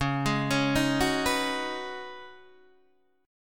C sharp 7th Suspended 2nd Suspended 4th, C sharp, down, Guitar, Open D